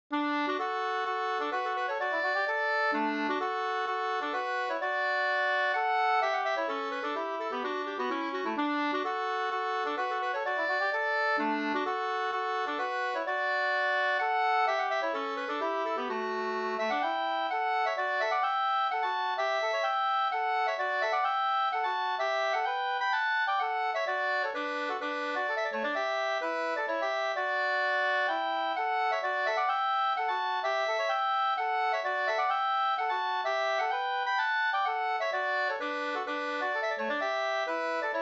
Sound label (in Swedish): polska Bodapolska